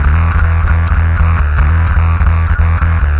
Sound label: 150 chemical bass, BASS LOOPS